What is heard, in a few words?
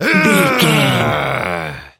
voice male c battle shout